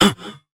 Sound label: Basic Attack; Battlerite; Herald